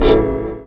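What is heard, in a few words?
chord phaser, Stabs; E Lab; XTc Files of House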